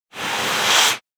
Vacuum suck

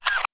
Shutter trans

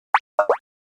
Boing rebound series

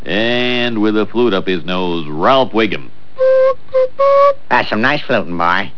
flute; Simpsons

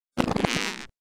rubber skids

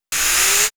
Spinner

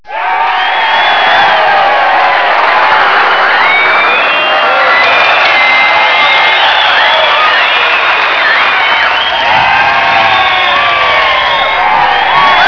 cheer long

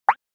Boink single high

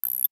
Cog wind beep

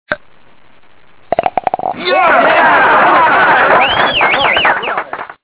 golf in cup

